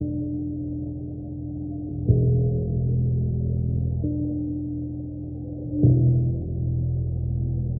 keys doomed

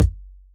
donkey, Kicks Soft

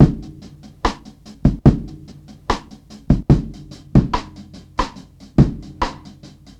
chunklover, Drum loops Boombap

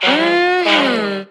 mmm hmm

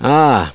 "ah", Voice

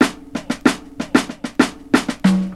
Drum loops, Rolls

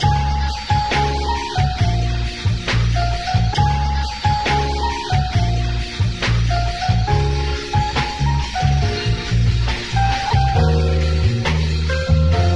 piano weirdo, Loops; Phonky

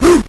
woo

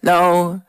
"no" SUNG; Voice; expletives

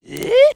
"huh"

